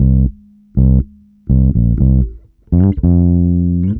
HEY BASSE, Roots Disco; USB Soundscan vol.32; AKAI

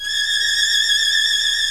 VIO MIN TRIL

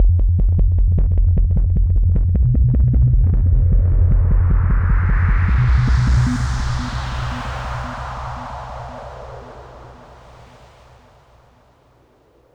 bass, hardcore breakbeat, 153 F min; Big Fish Audio; Hardcore Breakbeat Action

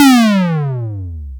JD800 Internal Drums, Roland JD800

